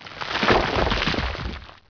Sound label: rocks falling; Deliantra